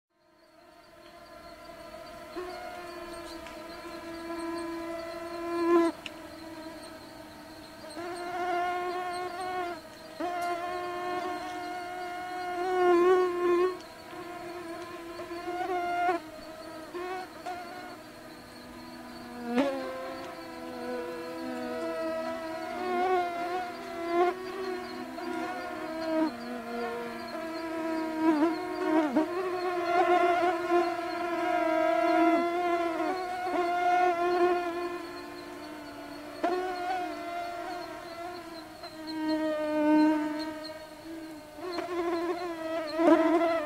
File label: mosquito sounds the buzzing noises and hum of mosquitoes close; annoying mosquito meme; made with Voicemod